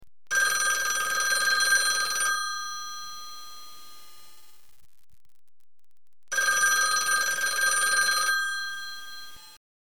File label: PHONE